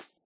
hop, rabbit; Minecraft

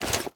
equip gold, armor; Minecraft

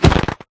crit, player attack; Minecraft

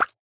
bubble, bubble column; Minecraft; block

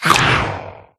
bite, phantom; mob; Minecraft

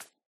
hop, rabbit; Minecraft

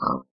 pig; mob; Minecraft

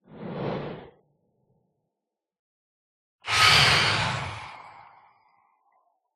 swoop, phantom; mob; Minecraft

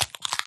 egg crack, turtle; mob; Minecraft

